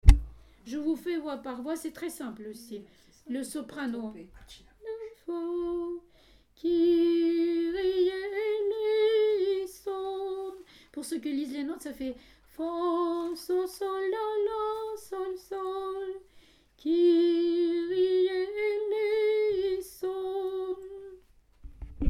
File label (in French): Kyrie Soprano; 1 petite ectenie